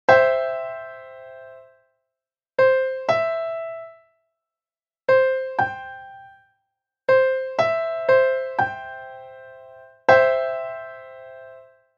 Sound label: Major Triad Intervals Example